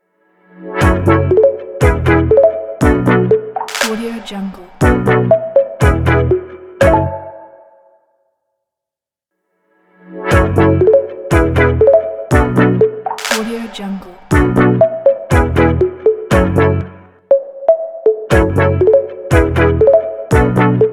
podcast intro logo

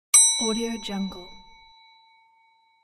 elevator bell ring

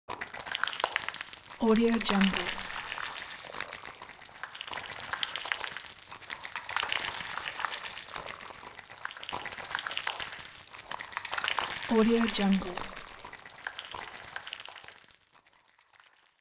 alien bugs

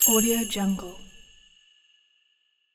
sleigh bell sound

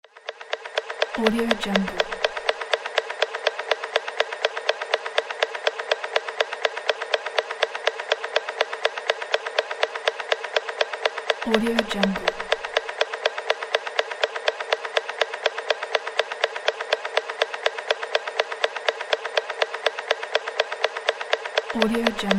piston

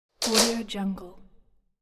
cable dropping; wistansound

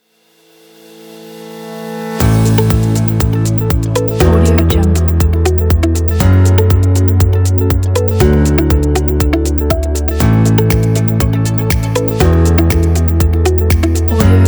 happy corporate; studio-j

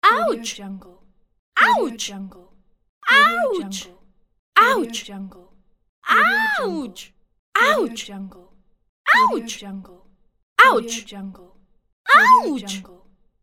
female ouch pack

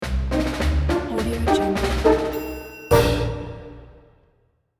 show ident

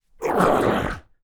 undead pain vocal wound grunt in pain

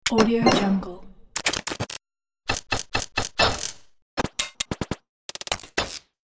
droid activated pack; sonic-boom

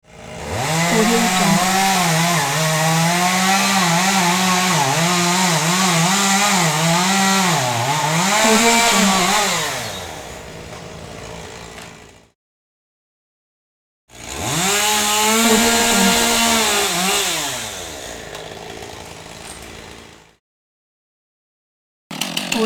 chainsaw pack; inspectorj

